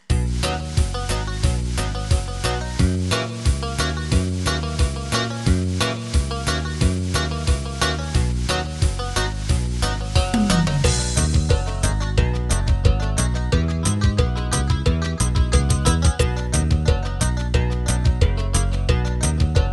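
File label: New Grass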